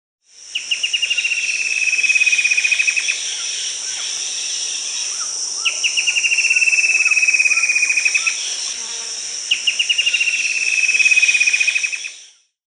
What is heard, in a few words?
Straight-billed Woodcreeper (Xiphorhynchus picus), Peru; S. America